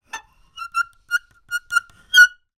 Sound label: wood squeak turn; foley; zapsplat